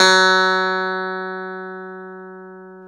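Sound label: clavinet; Roland LCDP10 Keys of the 60s and 70s 2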